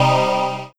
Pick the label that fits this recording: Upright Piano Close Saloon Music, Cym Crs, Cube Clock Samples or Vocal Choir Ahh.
Vocal Choir Ahh